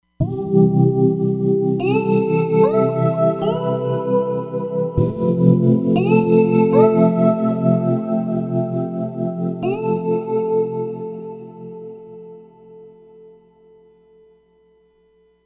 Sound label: Nu Pad, Roland Fantom XR